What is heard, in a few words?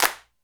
Hnd Clap, Alesis D4 drum machine